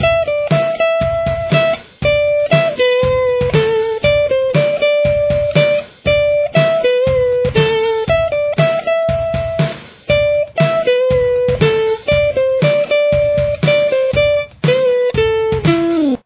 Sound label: solo guitar